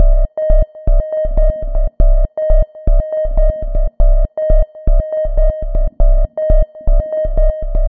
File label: Cross Hemispheric Pulse; Extra Tweak Grooves